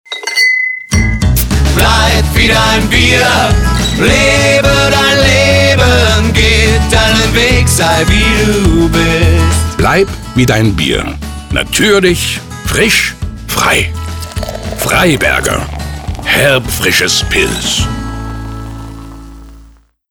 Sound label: song radio; freiberger brauhaus